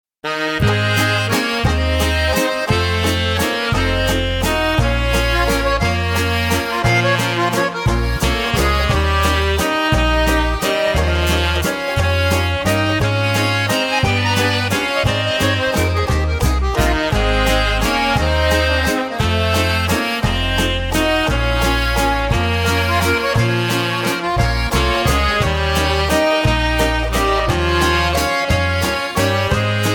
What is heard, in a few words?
Waltz